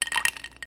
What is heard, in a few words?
glass; IceCubesApp